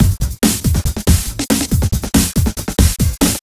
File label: Breakbeat 140 BPM